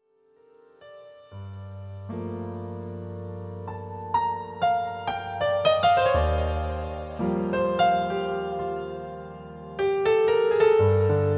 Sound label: piano